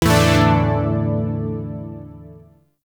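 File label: Shawzin Pentatonic Major Chord; Zariman Shawzin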